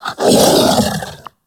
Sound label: Kubrow Tame Sleep Idle Wake A; Warframe